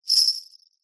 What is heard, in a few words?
musical sleigh bells shake single